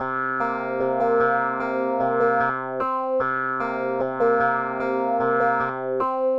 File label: clave 2nd line; Musique Funk Piano; Clave Second line 2+3; Avec main gauche; Sur une mesure